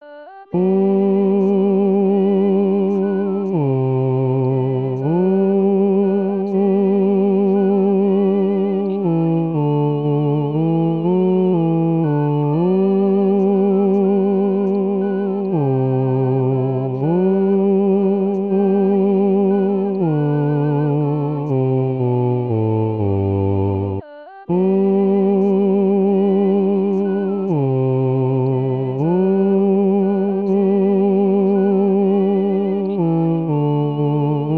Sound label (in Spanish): BAJOS; Amazing grace sol